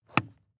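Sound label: sports car interior pedal clutch step hard; verkehr transport; autos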